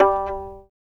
fiddle pizz G3 L; Sampleheads; Whole Lotta Country 1 & 2

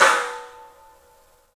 STEEL, Drums, ROOM SINGLE; 3D Sound

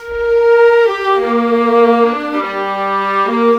Viola, Cinematic; Sonic Foundry (Sony Creative Software); Orchestral Series VOL-3 - Cinematic

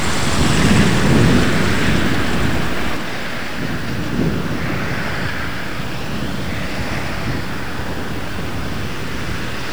loop, techno atmos

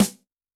Snare, Snare; Yamaha DTXpress-II

